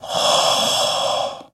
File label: Werewolf Breath Airy